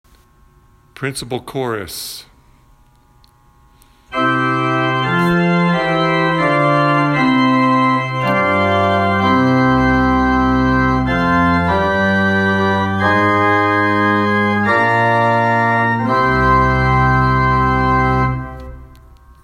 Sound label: Principal chorus lake with chords, pipe organs; Leek Pipe Organs